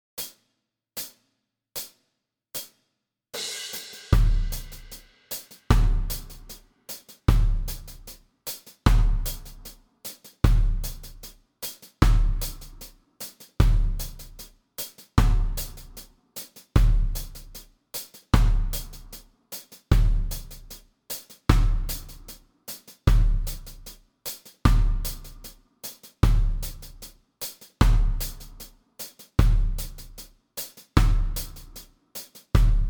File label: 76bpm, bass